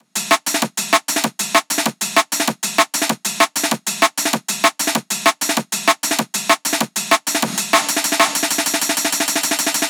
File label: AMEN GATED HOT